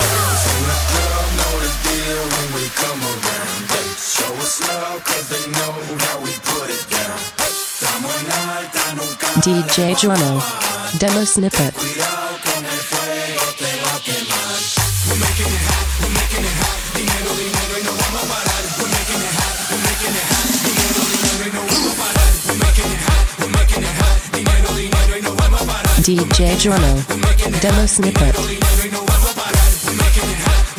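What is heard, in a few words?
130bpm G minor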